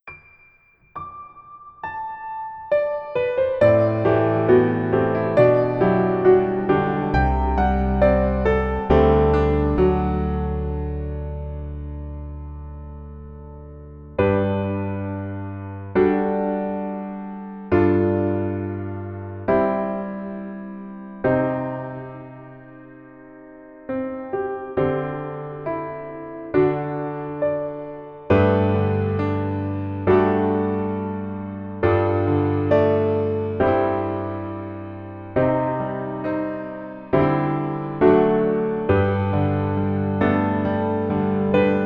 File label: Piano